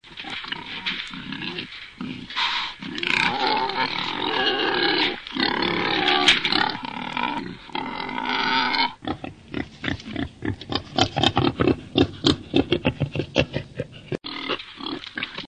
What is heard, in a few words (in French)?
sanglier